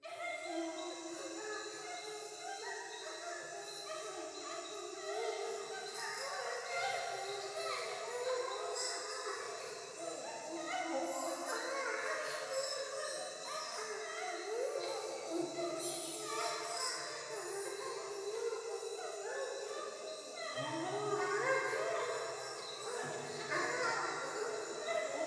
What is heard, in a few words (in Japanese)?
風呂 喘ぎ 山奥夜